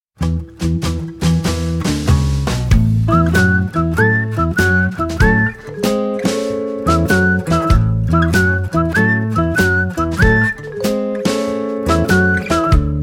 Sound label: whistling music; dolphin tunes